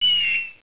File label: EAGLES; Warlord3